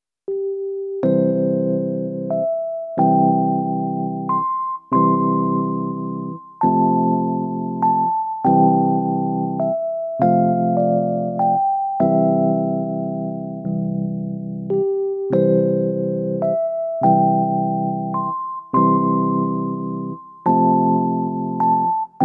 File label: SV-1 ELEPI